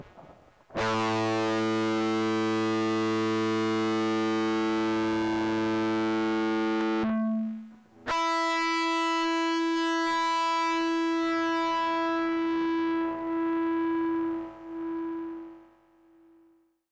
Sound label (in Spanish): distorsion, Guitarra